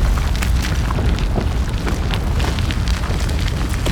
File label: dirt Road Rumble